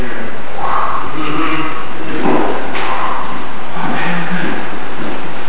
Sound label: Whoosh